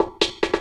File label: LOOP SD, Drum & Bass, 140 BPM; Ueberschall; Drum & Bass Resonance